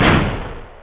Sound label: car crash